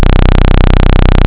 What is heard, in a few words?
sawtooth